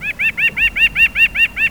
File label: wren